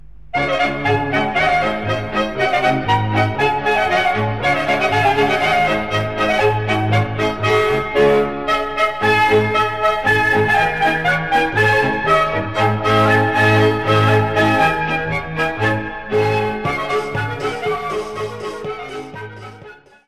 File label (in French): Kolo, Republque tcheque